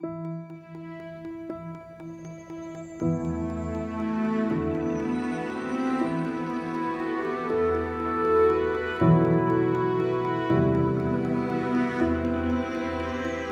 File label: Drama Ambient